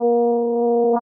Sci-Fi Electronic Force Field; Science Fiction

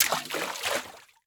Liquid Water Water Water Balloon Dropped In Water

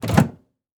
Household Microwave Door Open

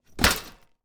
Sports Bag Duffle Bag Drop Metal Surface

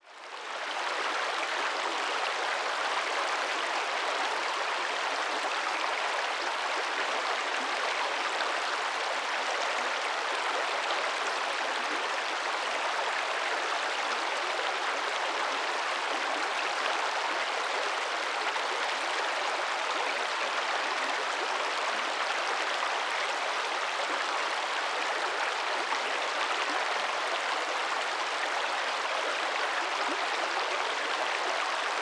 Liquid Water River Flowing Water

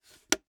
Foley Styrofoam Coffee Cup Lid Close

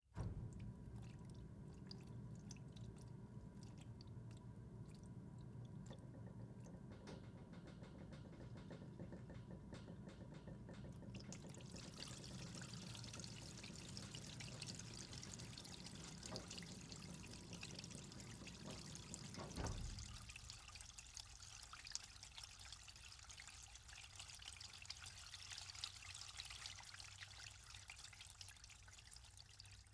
Liquid Water Pouring Through Pipes Mic Near Pipe

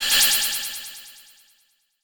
Multimedia Internet CD-Rom Flash Electronic

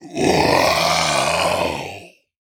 Horror Monster Growl

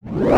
Multimedia Internet CD-Rom Flash Pop Up

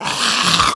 Human Male Clearing Throat

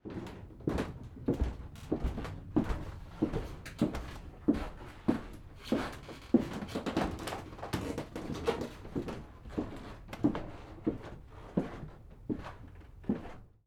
Foley Footstep Person Walking On Second Floor; Foley Footsteps

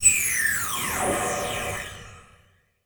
Title Transition Laser Long; Production Element

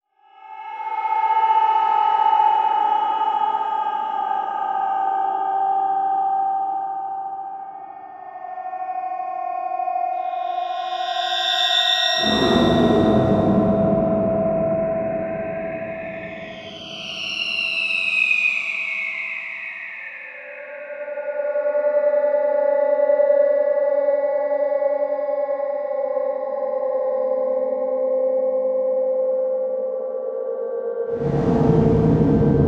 Electronic Whoosh Reverse Feedback Digital; Production Element Title Imaging

